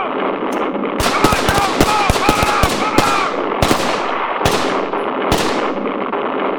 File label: shots